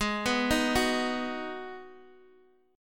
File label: Ab Minor 7th Ab, Guitar DADGAD; arpeggiated; x,11,9,8,9,x; down